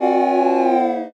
commotion

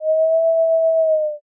erhu

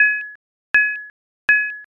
radar

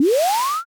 zoom

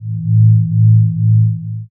space drone

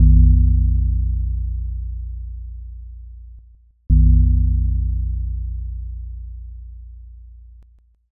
belltoll

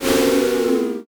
sigh crowd

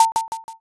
bat hit

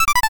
stairs down

